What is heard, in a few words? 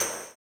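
tambourine, 160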